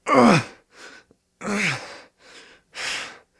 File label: low health; police